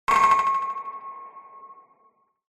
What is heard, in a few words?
sound design hit pipe hollow metal echo designed high pitched; zapsplat